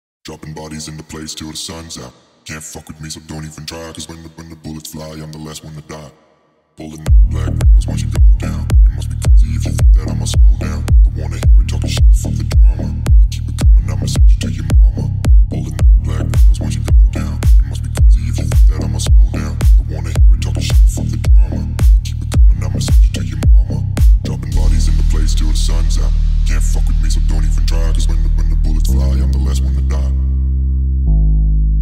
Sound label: bass boosted